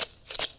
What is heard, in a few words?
MULTI RATTLE; Best Service; World Colours